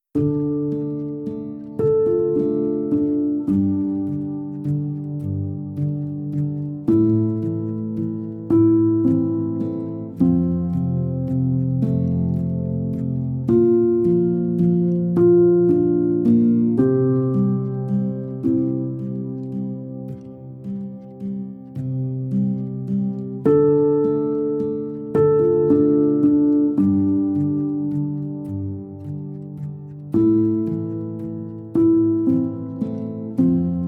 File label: Instrumental